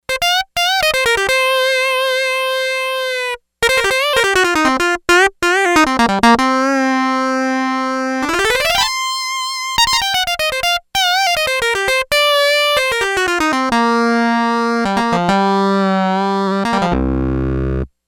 Synth Solo, Yamaha DX11; Factory Preset Voices

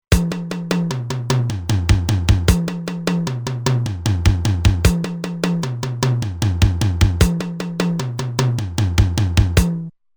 Fill In 4-4, Roland TR-505; Factory Rhythms

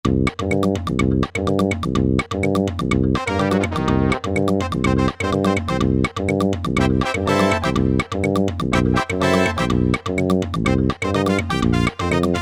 Afro Salsa

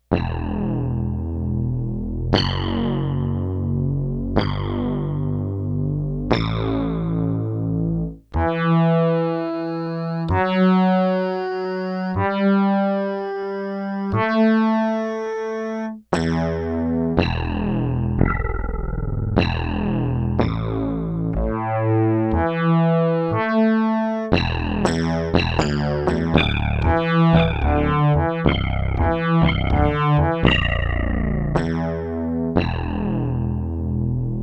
CHAINSAWED BASS PLUCKED BASS

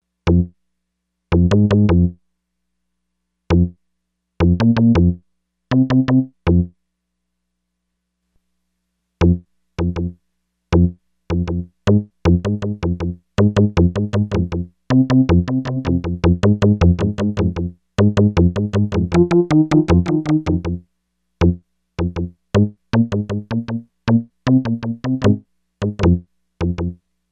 MULTITAP 80BPM

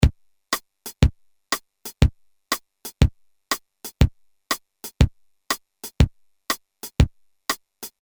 Reggae, Boss DR-220A Preset Patterns

